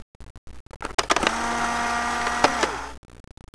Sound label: snap